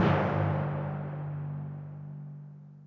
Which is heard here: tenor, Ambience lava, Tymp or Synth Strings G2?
Tymp